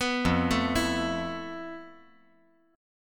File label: F Major 7th Flat 5th, chord; 4,5,5,7; down; 5G,4C,5E,5A; chord shape